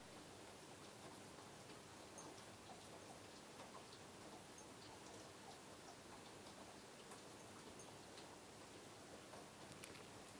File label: quiet rain